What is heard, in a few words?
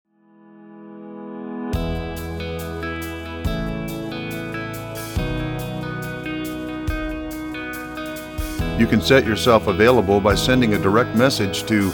motivational